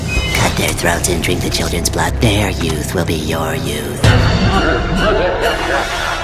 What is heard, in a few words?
twotone; svencoop